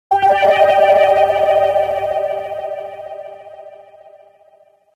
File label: Laser Suspense